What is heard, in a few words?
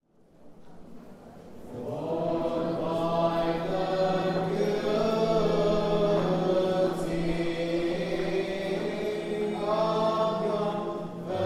Akathyst Troparion (English); St Nicholas Punchbowl; Awed by The Beauty